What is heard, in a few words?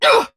Pain, Battle; Warframe; Dax; DaxRanged; en